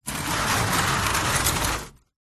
industrial large heavy 10l tank plastic full water slide hard ground; zapsplat